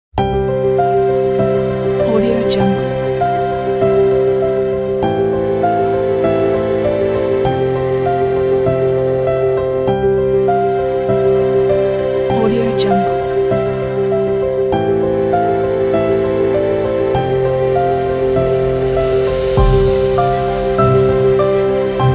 brilliant piano uplifting

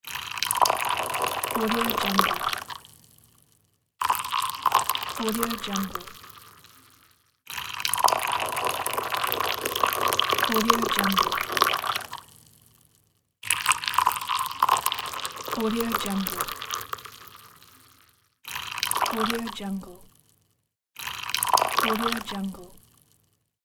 pour coffee

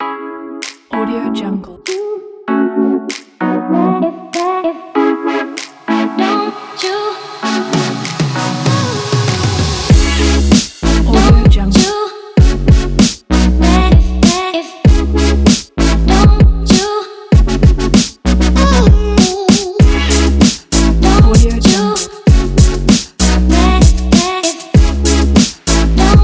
hiphop

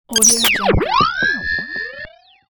receiver glitch

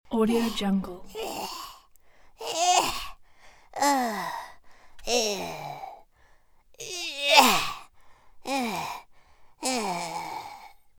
cartoon tired effort voice

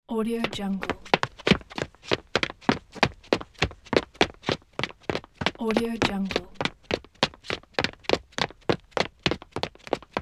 step running; winter-soundfx

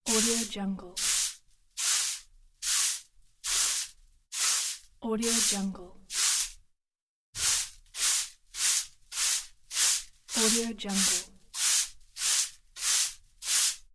broom; fx-library

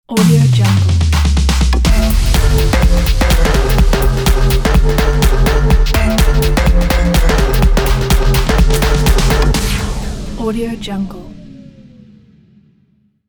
house logo

